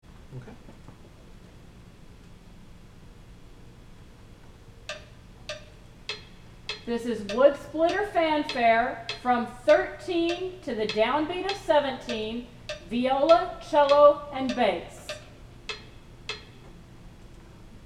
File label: Wood Splitter Vla Cllo Bass